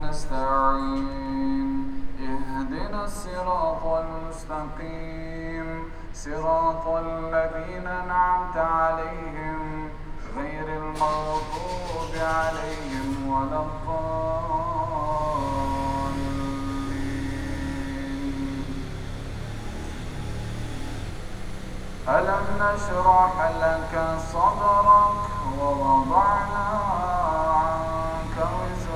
dombfeloli muezim motor

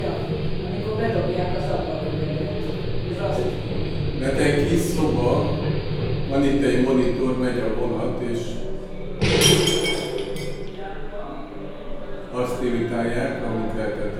atombunker vonatablak

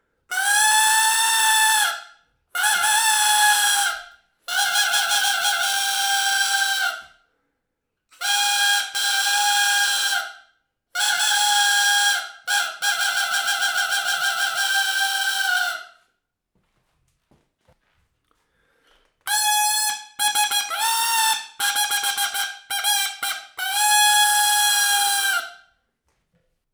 trombita, szilveszteri; perkupa

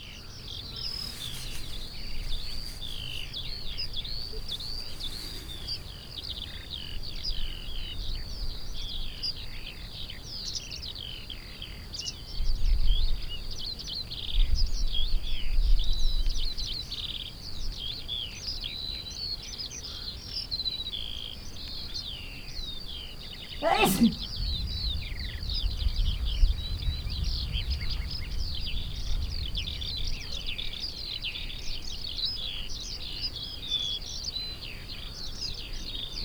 mezei pacsirtak harom tusszentes hortobagyi puszta

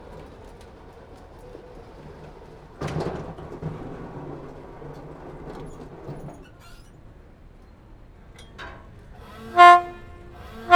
indulo hajojarat kurt

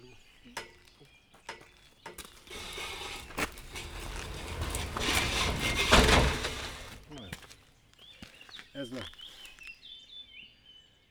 kapu behúzása, kapu sínen futó; vizhaz; cardioid

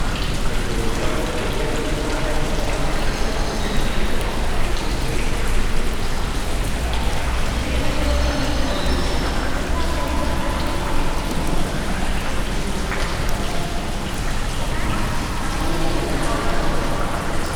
nyitott barlang emberek

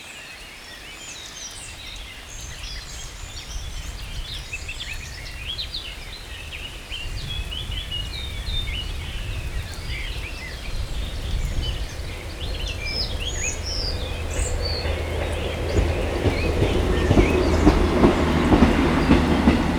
bzmot, vonat kívülről; vizvar felol; vizhaz; cardioid

